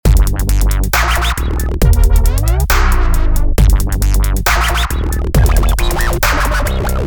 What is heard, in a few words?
dubstep